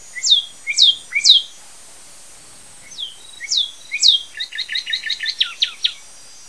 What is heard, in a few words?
northern cardinal